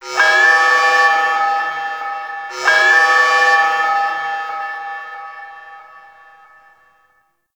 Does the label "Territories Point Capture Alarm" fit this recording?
Yes